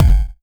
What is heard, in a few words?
Kick, 8-Bit; Games And 8-Bit Kit Volume2; atomsplitteraudio; chiptune